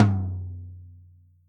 Studio Toms Hit

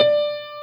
jazz D5 Half note

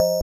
BELL; osu

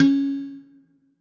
Kalimba C#3

